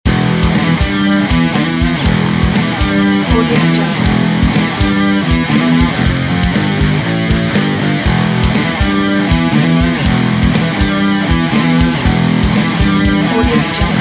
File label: intense tension metal; dynamic-sound-productions